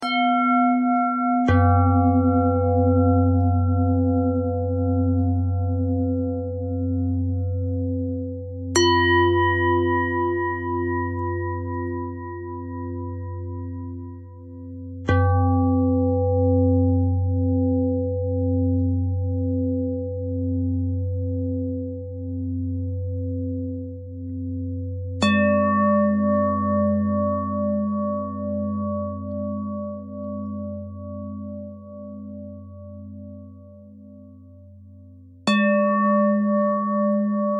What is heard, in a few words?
bell thread bend thread